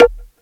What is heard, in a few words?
PERC, PERCS KIT